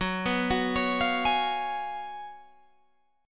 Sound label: F sharp Major 7th Suspended 2nd Suspended 4th, Guitar, Open G